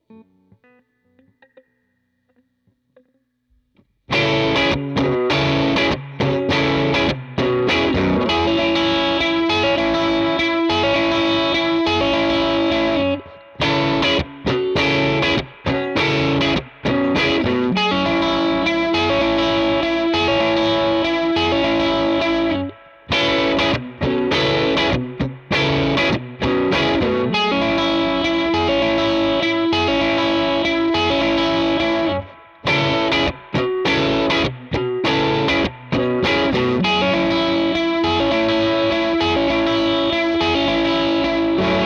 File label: guitar